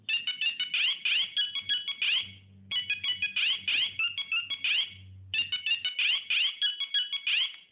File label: nokia 1100 ringtone